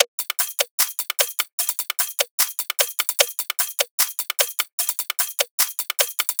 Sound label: top loop asmr hats 150